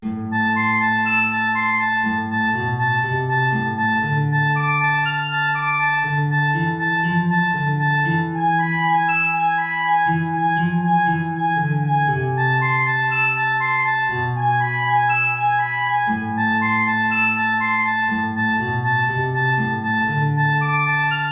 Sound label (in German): Allegro Oboe Gitarre hoch